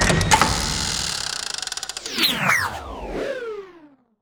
Lock Spin Zing

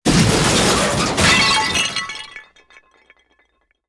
Crash crack

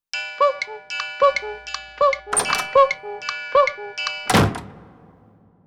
Cuckoo Slam

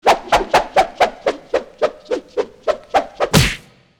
Whip It slap

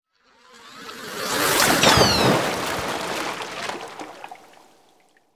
Missile Splash

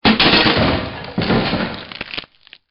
Bucket Crunch

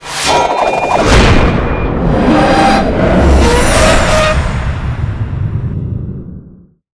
Blade Launch

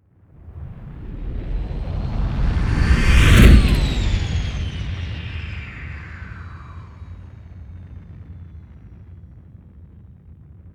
Whoosh Spaceship

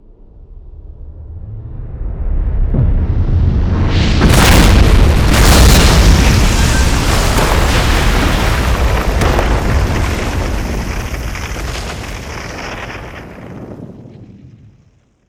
Explosion massive

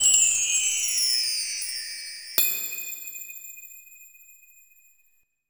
Magical chime

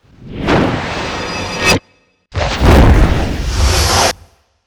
Jumps

